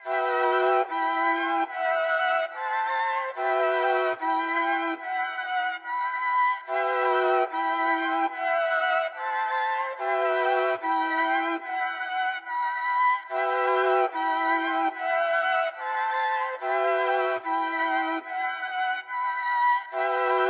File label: 145 BPM E Min High Melody, Drill; Loop Stems; Cymatics; 2022 Melody Collection; Flows